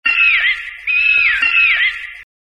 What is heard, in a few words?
Red fox yipps